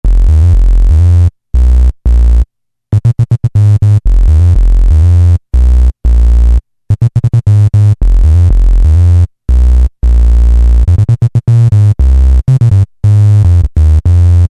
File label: Bass, Korg MS2000; Factory Patches